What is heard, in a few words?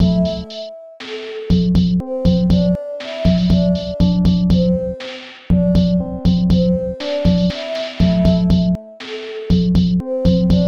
Sine wave